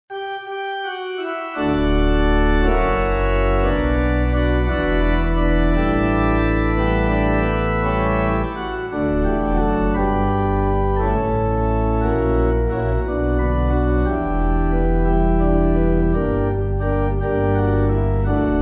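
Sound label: Pipe Organ, Music Backing Files for Churches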